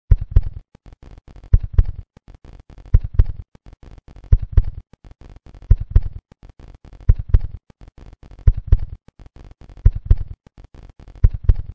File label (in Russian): Stuk Serdca stuk serdca